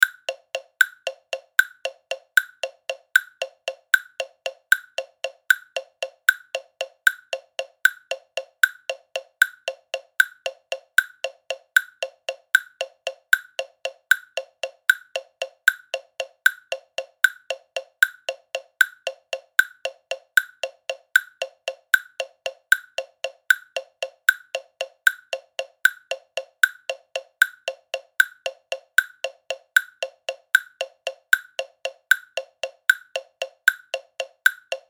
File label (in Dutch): metronoom 3kwarts 230bpm